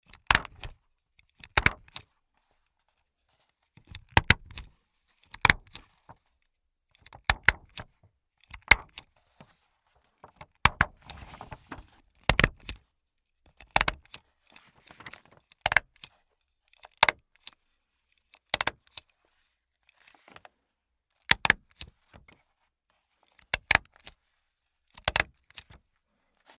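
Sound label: stapler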